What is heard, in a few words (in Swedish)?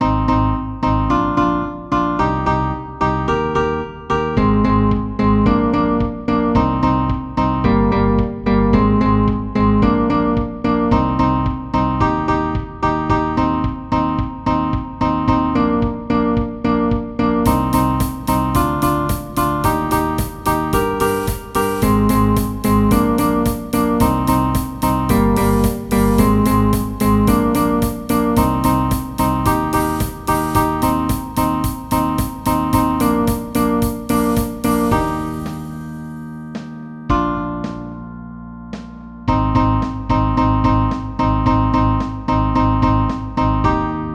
Gitarr övningstempo